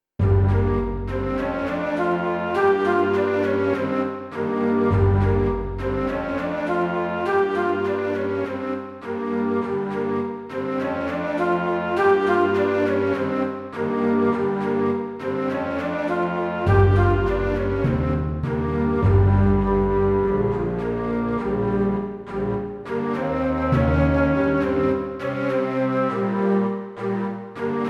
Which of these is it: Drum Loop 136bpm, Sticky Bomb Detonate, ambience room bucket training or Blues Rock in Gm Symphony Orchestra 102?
Blues Rock in Gm Symphony Orchestra 102